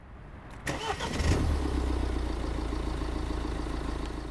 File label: Car Ignition Start Sound